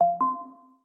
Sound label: notification; TikTok